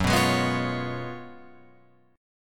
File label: F sharp Major 7th Suspended 2nd Suspended 4th, Guitar, Open F; F#M7sus2sus4; strummed; 6,6,8,6,8,8